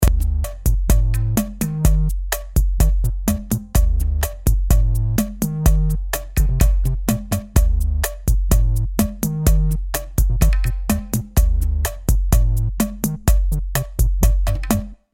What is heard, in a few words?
bgm totem cool loop; KarmaZoo; Totem Cool Loop 1